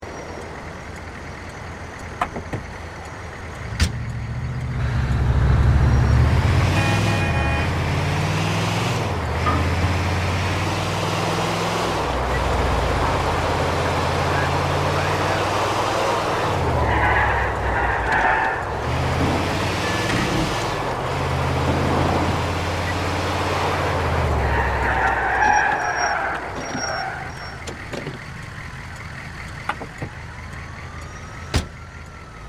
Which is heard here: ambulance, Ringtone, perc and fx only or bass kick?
ambulance